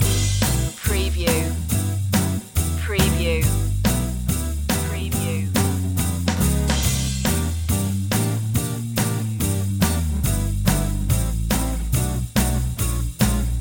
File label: swing 81 loop